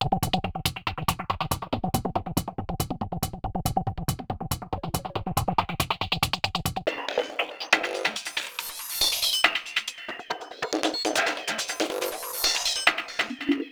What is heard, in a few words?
Stealth Loop; bolt 2008 pc gamerip; RDX